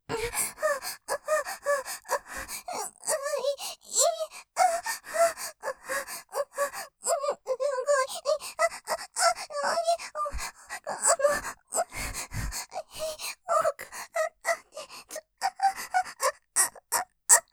喘ぎ 息も絶え絶え感じちゃう; ステレオ

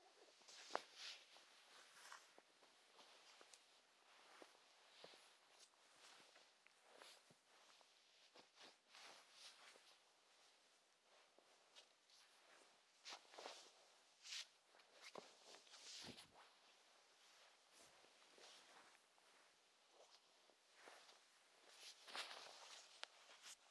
布団もぞもぞ右耳へ

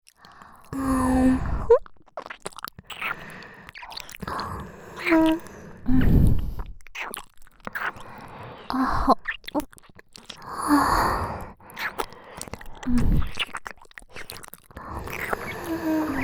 両耳舐め; L会長・R副会長

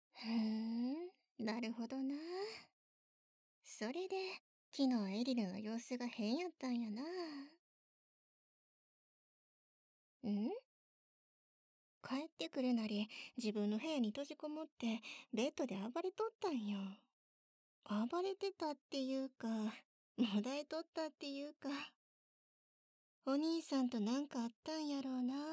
ディープキス, 関西方言; シェリのディープキス; ヤキモチ・イメージトレーニング♪; 効果音なし; ハーフサキュバス

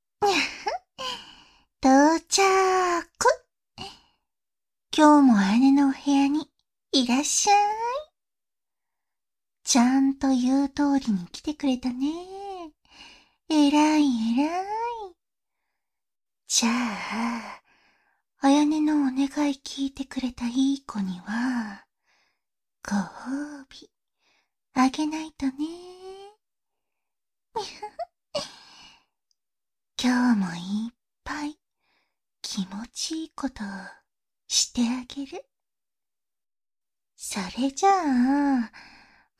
耳舐め手コキとフェラチオ調教 右耳用

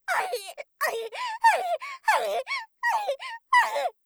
あひ; 効果音なし

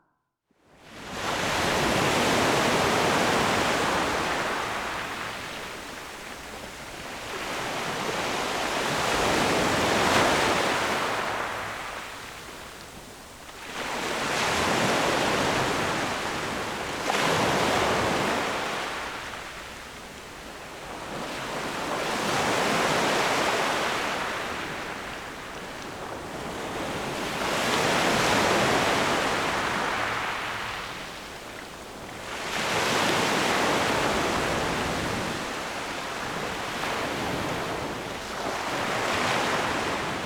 前戯編; Trip-Orgasm

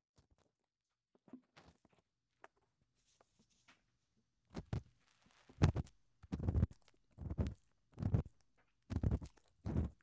耳かき棒 右

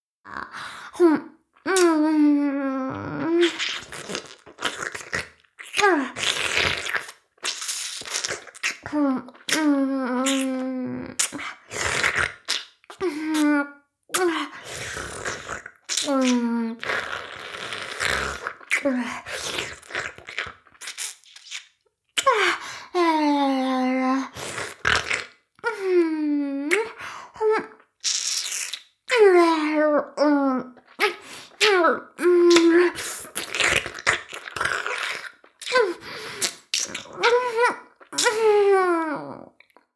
耳舐め音声, ASMR; 援交JK魔法少女マスカリチェリー

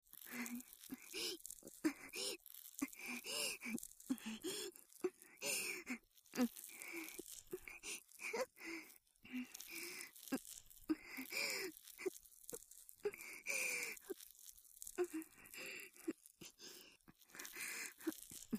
ループ濡れ綿棒（左立体版）, ASMR

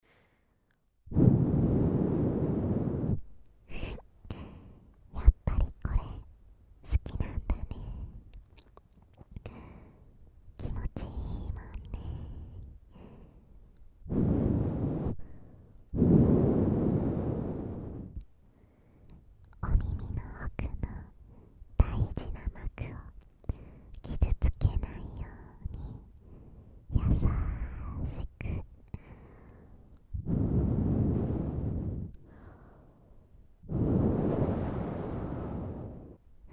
お姉ちゃんのお耳ふーふー（左耳）; 心拍音off

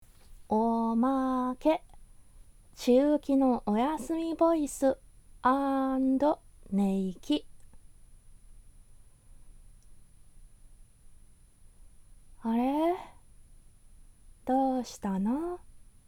お休みボイス＆寝息 ボイスのみ

